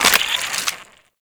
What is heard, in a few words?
Infested Sniper Rifle Reload; Weapons